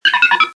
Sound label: r2d2; Star Wars; Star Wars Misc